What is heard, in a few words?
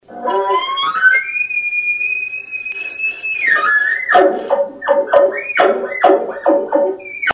Elk Bugle